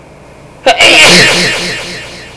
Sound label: SNEEZNG; persone; starnuti